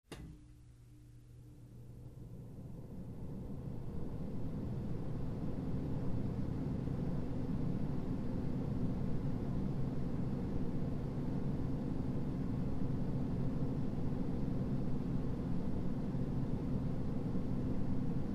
Air Conditioner Vent 2; On, Run With Mid Range Rush Of Air Smooth, Off